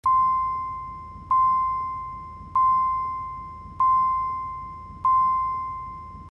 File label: Car Door Open warn tone